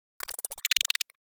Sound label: Insect Squeal Movement Single; Hollywood Edge; sound designer's toolkit v2